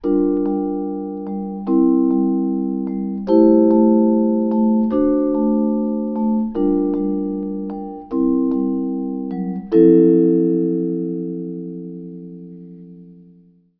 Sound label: pedal